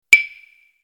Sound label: ding